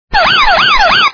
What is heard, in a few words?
Alarm sound, Door Magnetic Alarm